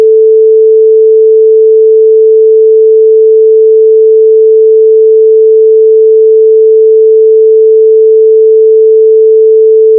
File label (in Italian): fondamentale, sawtooth; pedersini